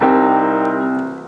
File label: Piano